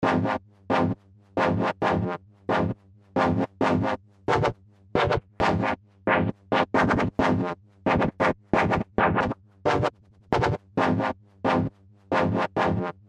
Wowo Pad; Amber Preset PD

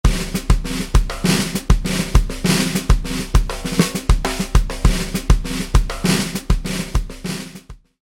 Gretsch with Sticks

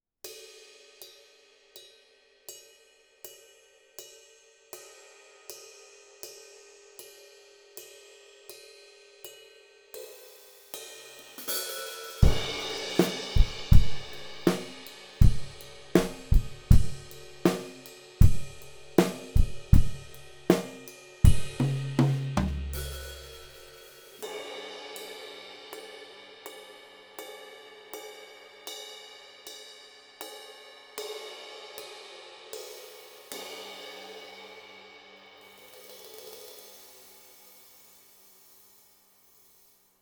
Drum Song

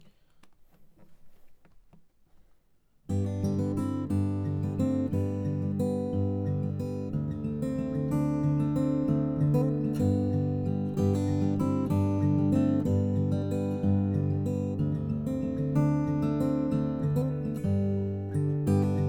Ac Gt Arp Most Bridge

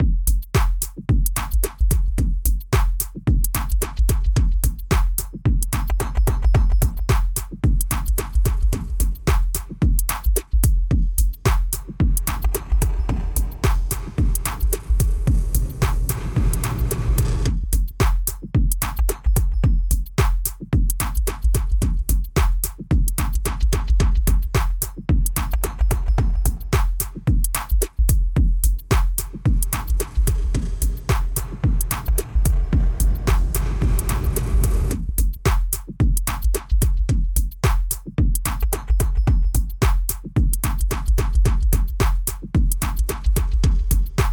Chugging House

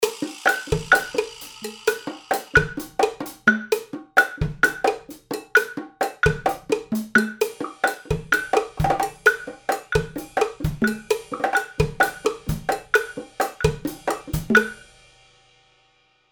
Rhumba Clave; fxpansion

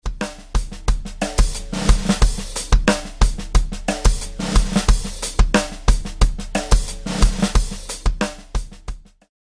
with Sticks; Cadeson

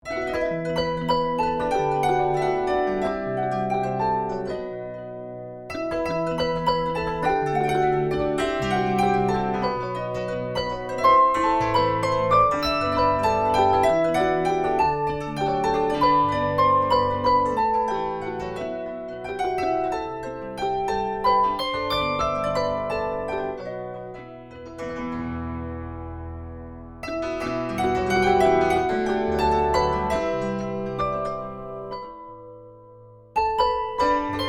Plucked Harp grand Halo

